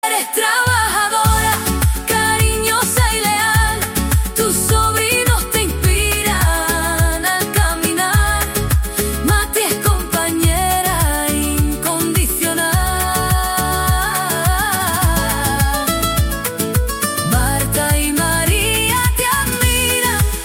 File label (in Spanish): flamenco pop feliz cumpleaños